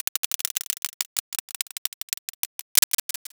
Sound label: roulette